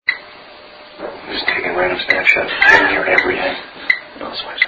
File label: surprised Breath